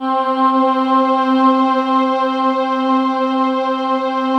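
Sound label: slow orchestra pad, Slow Pad 5th; Optical Media International; Sonic Images Library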